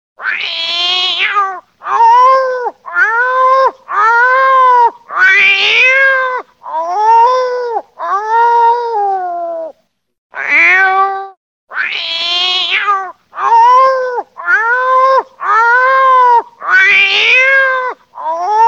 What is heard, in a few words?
cat is howl, cats howling at night